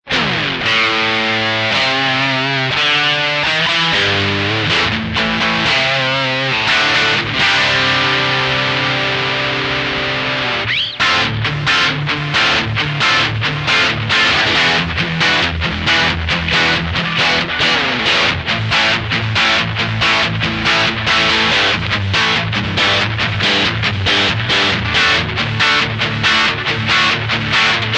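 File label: alchemist 90 bridge dirty, guitar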